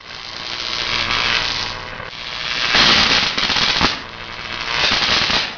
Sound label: zpm loop; quake2; kitsune